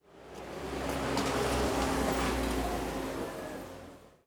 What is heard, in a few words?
dust car driveby; ambient atmosphere; csgo; Counter Strike Model Pack